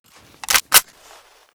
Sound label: mp9 misfire, weapons